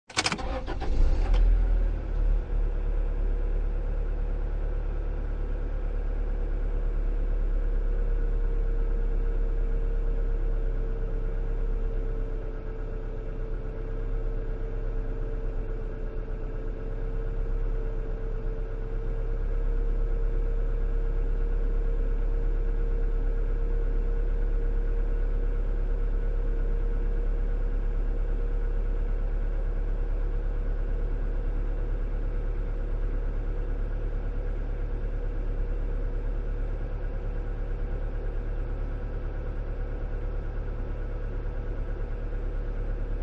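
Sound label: Car Interior Start Idle Turn Off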